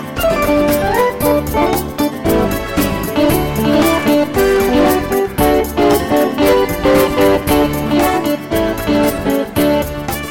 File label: A Hot Soul